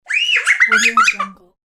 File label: little girl squeal laugh; human sounds